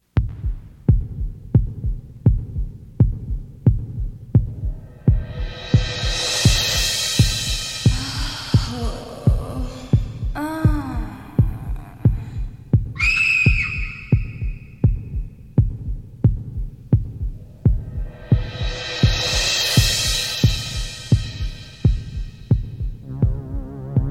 Screaming, 3D Effect sounds; Funny RingTones

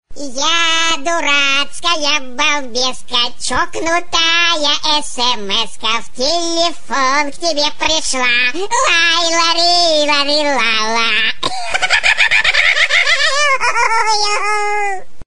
SMS Ringtones funny and crazy; Sms Mix

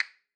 Keypress Return, ui; Mi Mix 2S Stock Ringtones

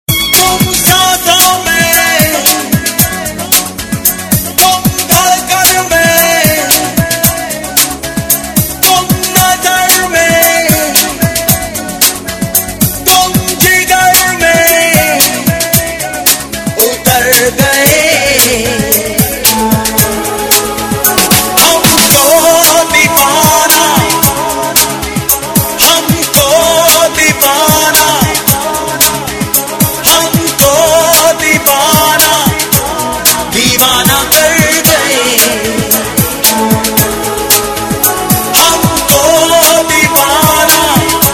Hindi Sad Ringtone; Hindi Movie

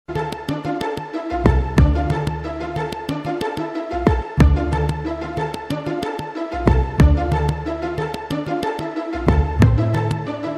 Ambient Chillout Ringtones

